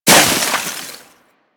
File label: Melee Impact Snow; Warframe; Weapons